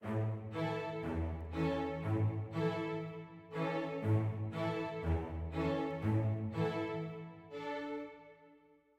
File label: dark med start